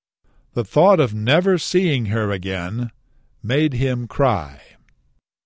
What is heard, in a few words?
CRY